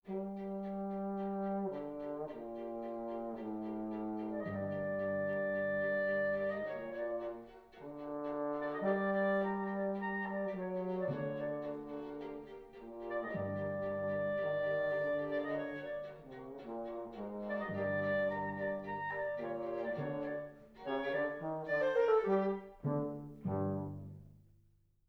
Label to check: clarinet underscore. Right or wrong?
Right